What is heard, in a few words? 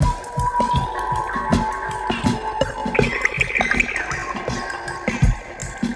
Ambi Groove